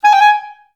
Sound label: G4, Advanced Orchestra; Best Service